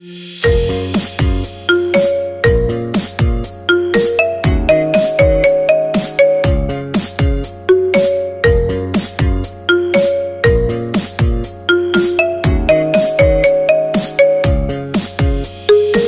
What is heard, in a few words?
Marimba Remix